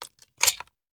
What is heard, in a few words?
Machine Gun Foley; Sound Ideas; Guns